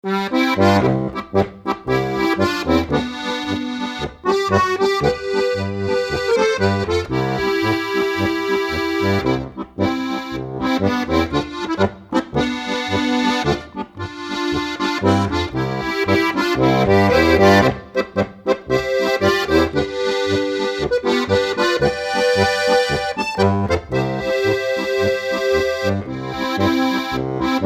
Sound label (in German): GCFB Lied, harmonika